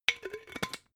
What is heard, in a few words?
glass bottle massive close cap